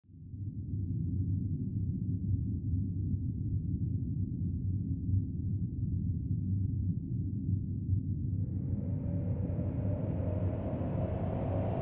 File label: Underwater explosion; explosions